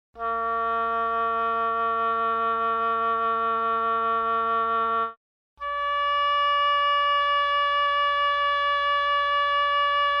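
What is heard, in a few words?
oboe, chamberlin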